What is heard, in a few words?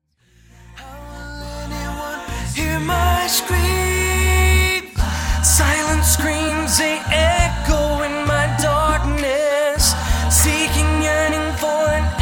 silent screams